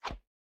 Swing Tiny

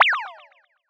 Emotion Sweat

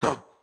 Chop Attack; Caffiend Caelan; Going Under

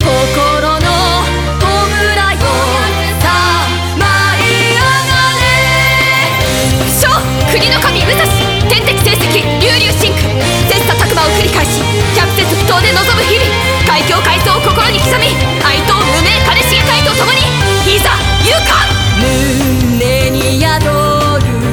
BGM Reach

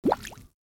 Monsters bubbles; The Binding of Isaac; SFX Monsters boss2

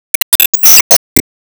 Enemy Evolution Active Charge

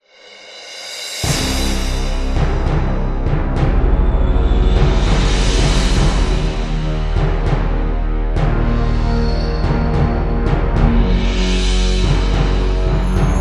Arena reveal

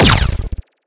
Shoot; EyeBrawl

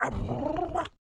Leap Wind Up; Joblin; Going Under

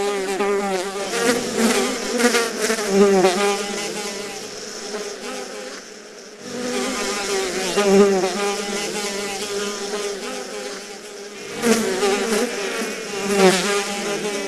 Flies; leisure suit larry magna cum laude

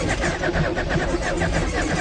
Rev Engine; Lethal Company

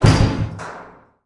Collision Medium; Lethal Company

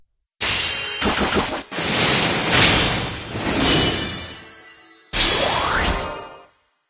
Pack Opening

